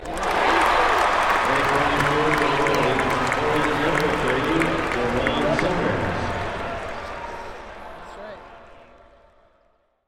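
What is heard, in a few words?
Arena Crowd Applause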